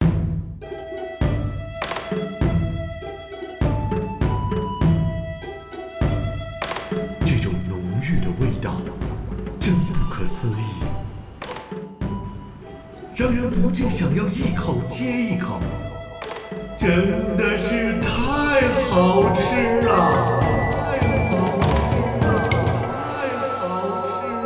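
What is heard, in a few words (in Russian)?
вибрирующий звуковой эффект вокала; smeshnaya eda odin ukus v seredine yazika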